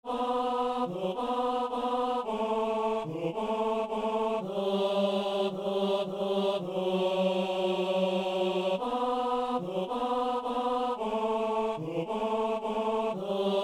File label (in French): alleluia psaume 117, basse; Saint-Denis